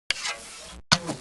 Wood Elf Attack Sound; Heroes3

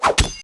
Attack Sound, Crew Mate; Heroes3

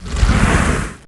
Hydra Defend Sound; Heroes3

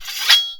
Defend Sound, Seaman; Heroes3

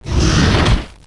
Hydra Death Sound; Heroes3

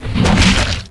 Behemoth Attack Sound; Heroes3

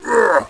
Walking Dead Hurt Sound

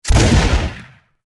shoot sound; Heroes3; Bounty Hunter

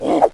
Walking Dead Attack Sound; Heroes3

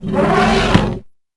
Mammoth Attack Sound; Heroes3; HotA

